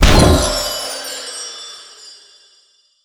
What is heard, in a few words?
SFX spell harness magic